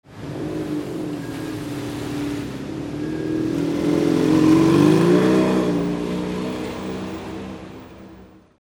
VEHICLES

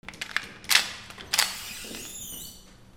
DOORS

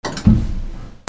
HOUSE

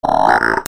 ANIMALS

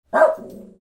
DOGS

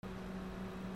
KITCHEN

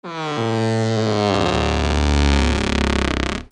TOYS

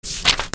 PAPER

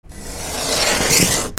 FRICTION

